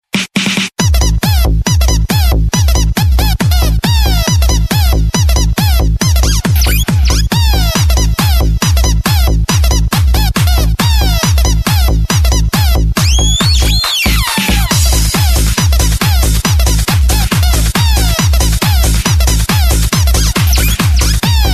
Remix Toy Tone

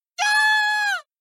Scream; Danganronpa

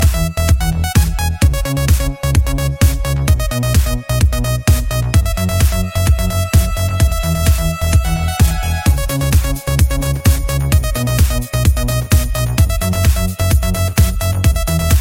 Clubbing tune

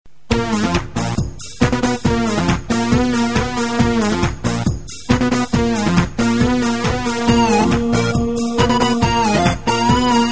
Aero Base Tone

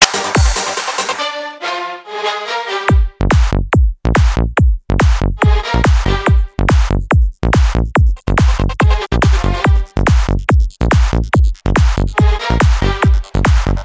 Fullon Noize

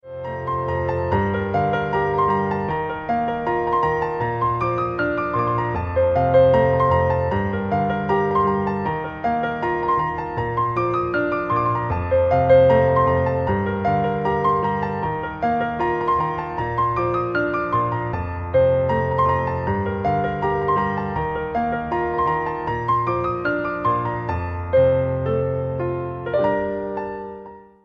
Lullabye

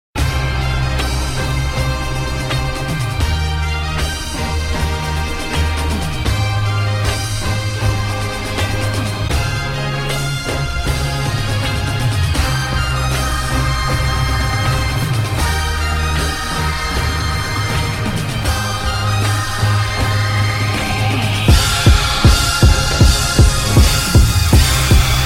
Epic

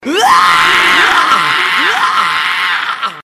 Waaaaa Scream

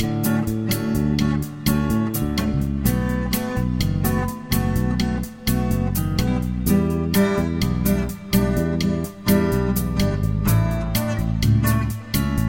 Bossa Nova

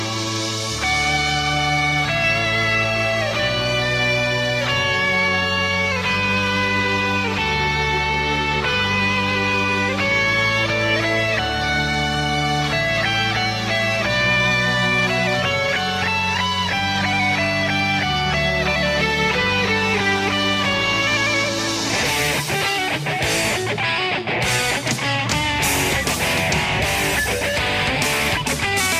Rock

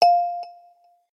Alcatel Echoed

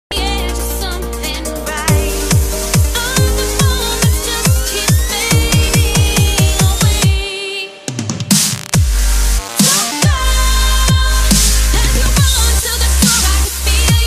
Dubstep

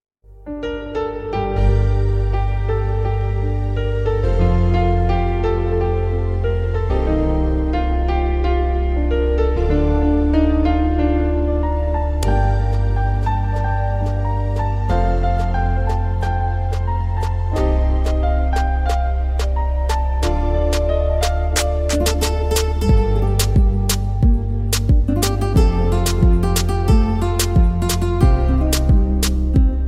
piano

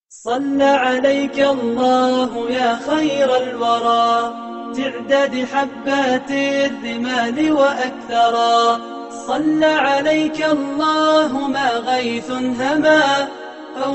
Beautiful Nasheed

